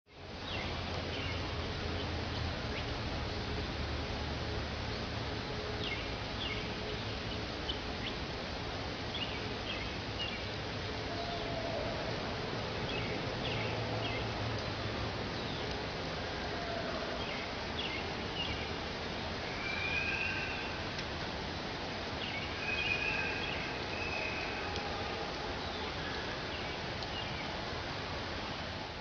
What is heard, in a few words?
Forest Birds Amb